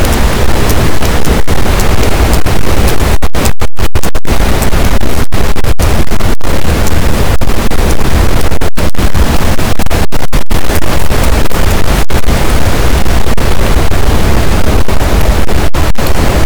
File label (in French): cachalot positif, boat, Physeter Macrocephalus